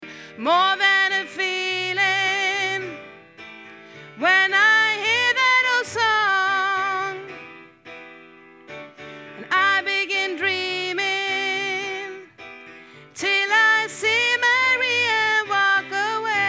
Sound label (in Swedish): bas